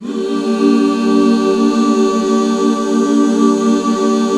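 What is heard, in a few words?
HAL G minor right, Back Chords; Sound & Vision - Gigapack II CD 1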